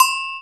BELL

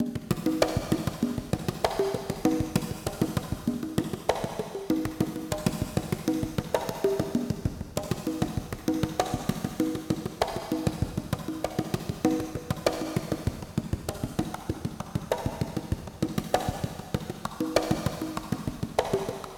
percussion congas busy

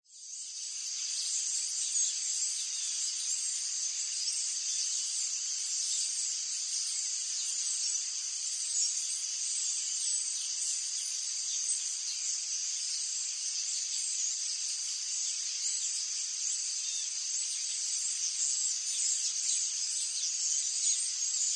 birdland, ambience

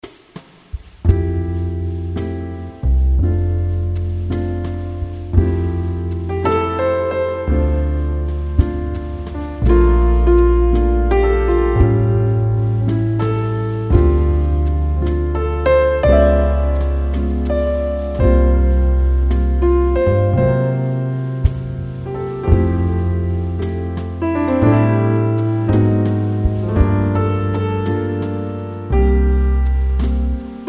Music background pads

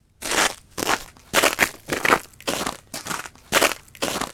Footfalls Gravel Wet; footsteps

